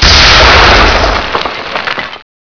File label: asteroid hit